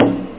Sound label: bongo; drums; nature